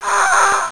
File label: die; ARRAKIS